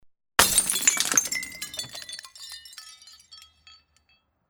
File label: Bottle Stacked